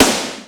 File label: NEW SD, MOD. DRUMS; East West; Global Sounds